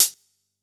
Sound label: hihat, electronic